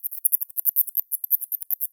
Nyctalus noctula, batcalls, europe

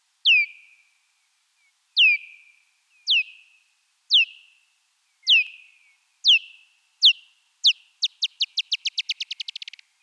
noctule 2

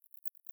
Barbastella barbastellus

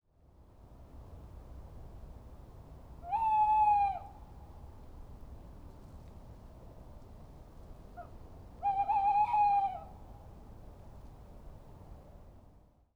Strix aluco

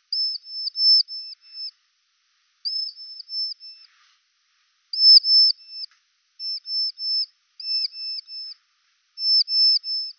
Rhinolophus euryale

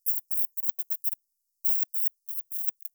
Pipistrellus pygmaeus, batcalls, europe

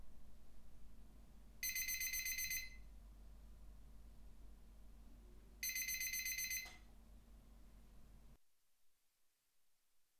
NT1A

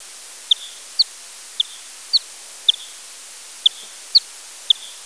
Barbastella barbastellus

